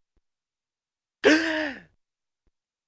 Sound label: barmaid gasps